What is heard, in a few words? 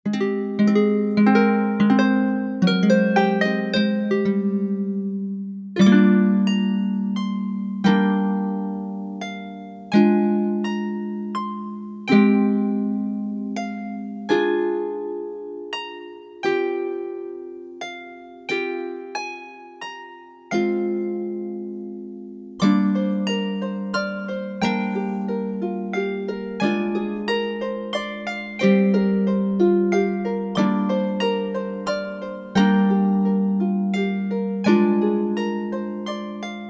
Harp; Librewave; Michaelas Harp Library